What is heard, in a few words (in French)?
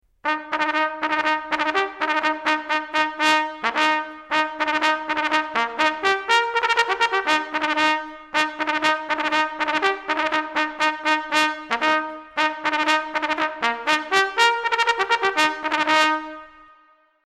Sonnerie La Marche